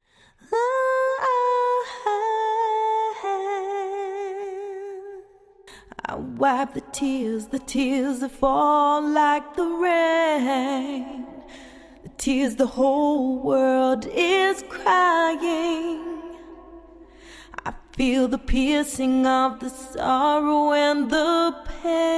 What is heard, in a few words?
Accapela